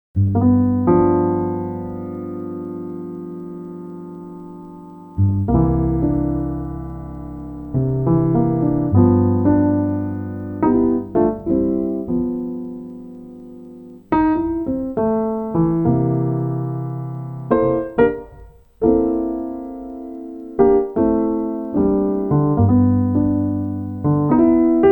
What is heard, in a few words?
Sonata, piano